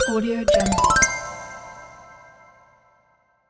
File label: game sound arp up fx